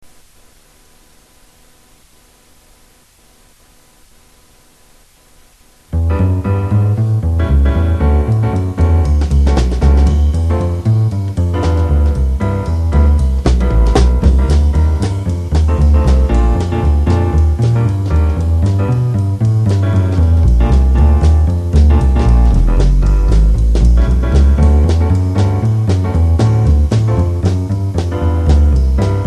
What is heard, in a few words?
jazz